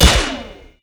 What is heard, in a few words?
Crit